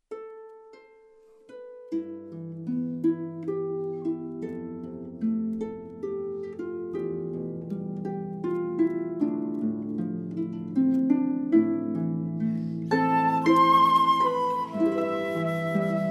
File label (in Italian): arpa flauto; matrimoni